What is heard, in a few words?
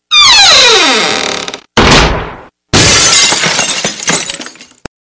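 Door Glass Large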